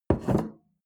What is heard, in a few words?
bowl place on table